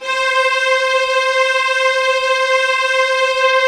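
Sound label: U220 string; Greytsounds; Scott Peers Sound Engineering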